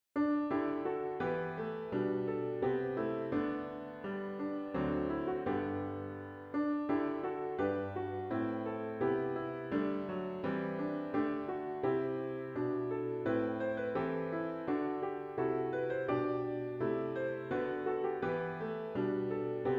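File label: Hymn piano with Intro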